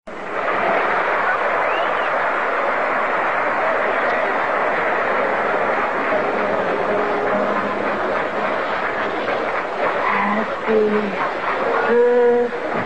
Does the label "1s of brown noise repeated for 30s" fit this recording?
No